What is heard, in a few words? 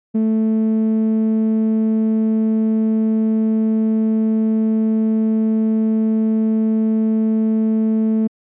VII spectro 17 overtones